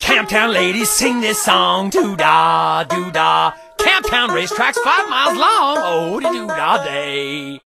Banjo; out from boneville; Camptown Races

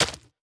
Hit Bug; We Are the Dwarves

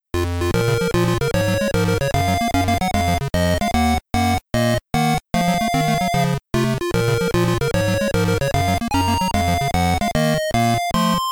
BGM (PC-8001); New City Hero

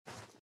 run sand; out of reach treasure royale 2020 gamerip pc

